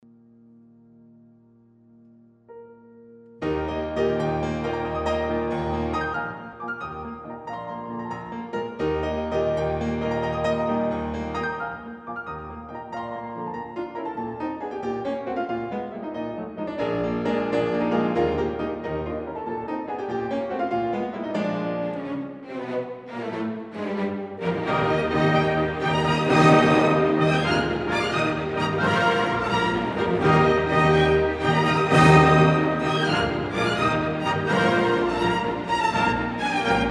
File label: Piano Concerto; Beethoven